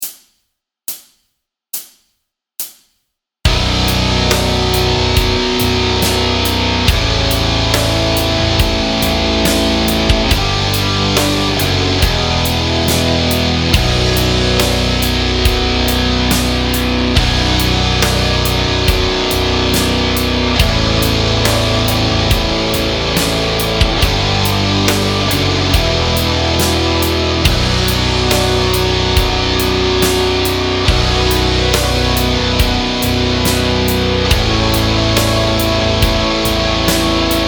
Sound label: Guitar Solo Backing Track